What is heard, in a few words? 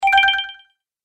Game Prize, video game